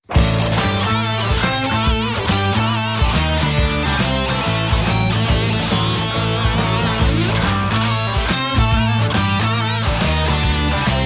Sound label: Boogie